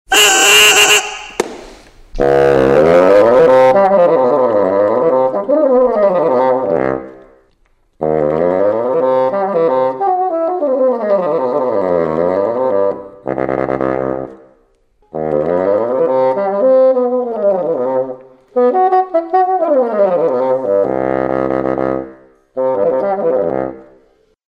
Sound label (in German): fagott